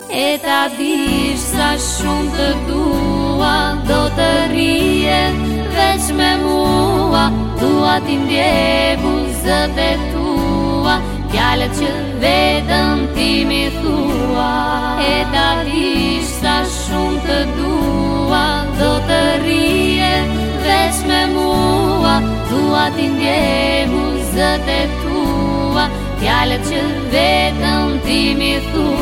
very romantic song

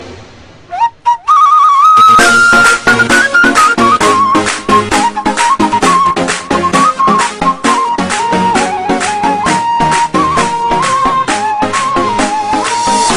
turkish dance flute

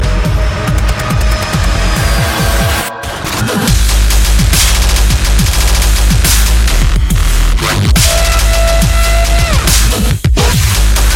machine gun; far cry 3

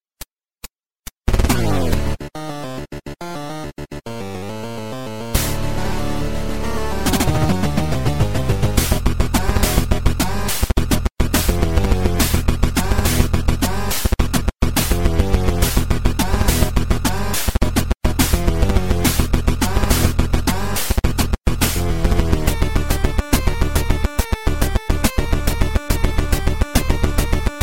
8 bit

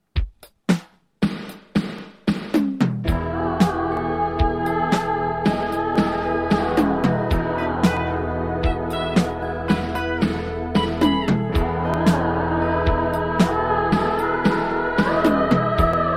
humming

